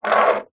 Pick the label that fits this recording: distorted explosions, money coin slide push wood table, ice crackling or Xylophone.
money coin slide push wood table